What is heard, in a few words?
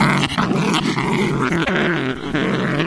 breathe loop, fast zombie; hl2